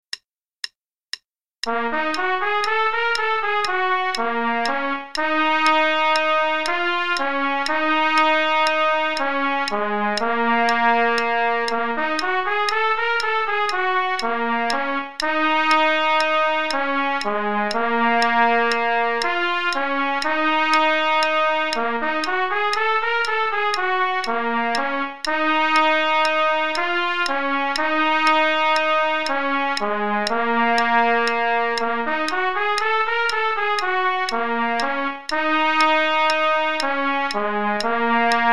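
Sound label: Play-a-long, quarter note = 120, trumpet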